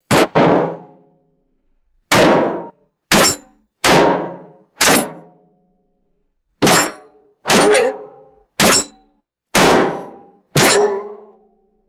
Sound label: repeated light metallic shield impacts; weapons